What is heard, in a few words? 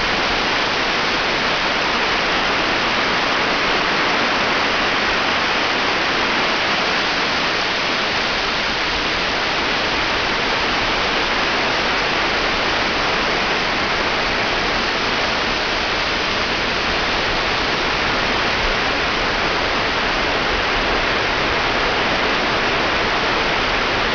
rain